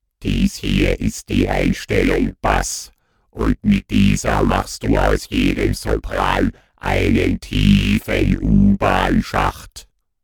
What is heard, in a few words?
Bass